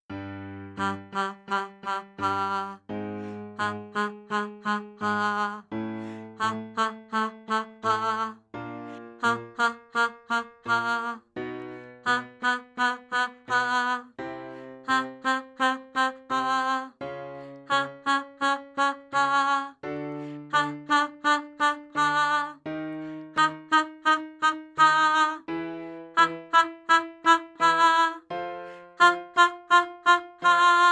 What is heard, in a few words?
staccato